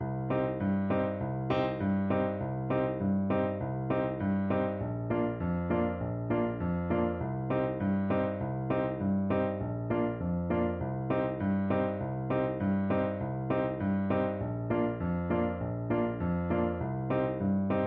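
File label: drunken sailor, alto saxophone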